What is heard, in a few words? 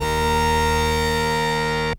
Synth C, Synth Prophet One Shots; Electronisounds